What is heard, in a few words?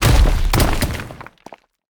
Gate deth